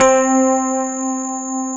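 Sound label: grand piano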